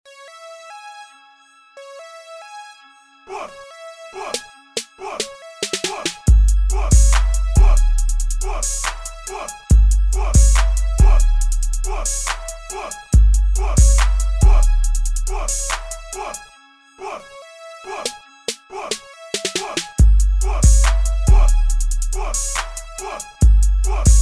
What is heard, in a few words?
GET CRUNK, Dirty South Beats and Crunk Instrumentals